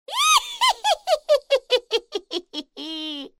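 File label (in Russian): потирает ручки довольная; Для Хэллоуина; ведьмы; Коварный злой смех старой ведьмы, забавный смех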